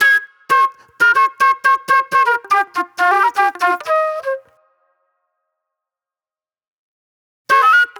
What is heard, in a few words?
Super Soul Flute